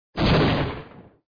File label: Intro Explode; Shop Empire 2